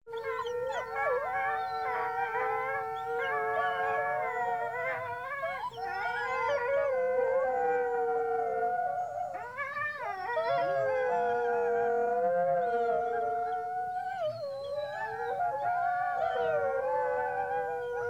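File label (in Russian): волки воют небольшая стая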